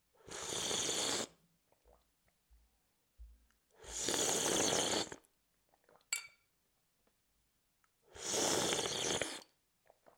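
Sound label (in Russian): звук хлебания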